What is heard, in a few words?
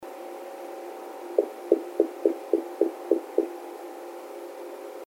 Wood Knocks; bigfoot